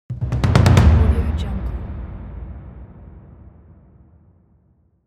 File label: cinematic drum roll